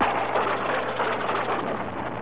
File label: lightning; cfclient